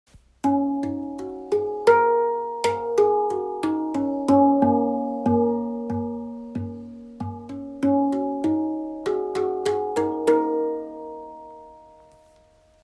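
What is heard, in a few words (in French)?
6 notes, Bol Chantant Tibétain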